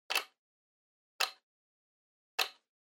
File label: Flip Clock Time Dial Slow